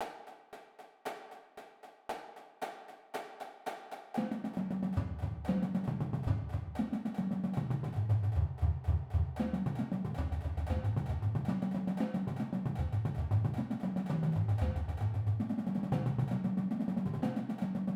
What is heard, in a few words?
8 flams, percussion